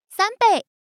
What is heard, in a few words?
Chip